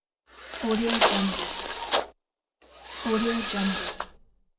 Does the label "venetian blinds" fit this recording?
Yes